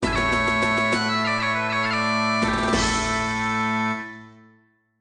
Celtic Tune

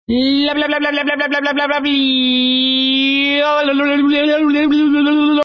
Vocal

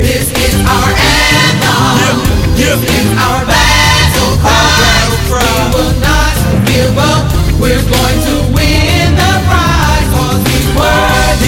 Praise Anthem

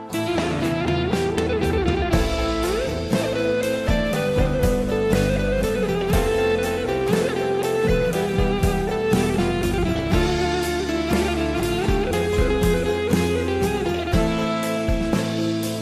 Elektro

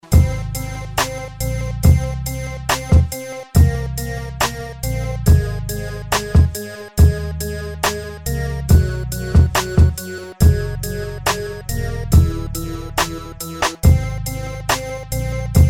Beat

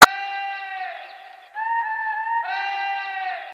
Sms Ring

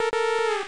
PC Warning; MMX

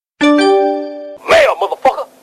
Email Tone

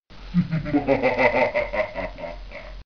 Evil Laugh

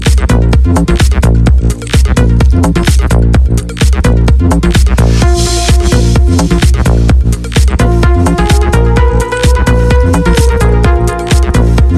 Perfect Techno

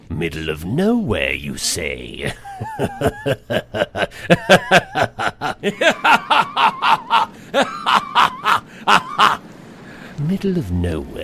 laughing; Bakura

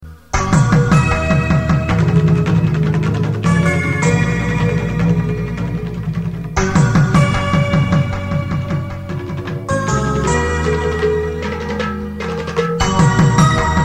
Melody Tone

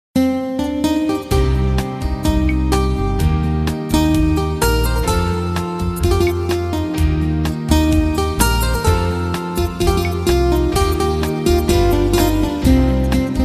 Instrumentals

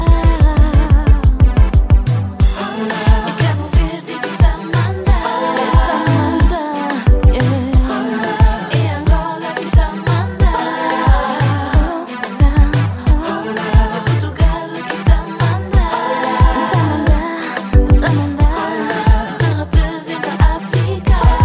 Kriolo Music

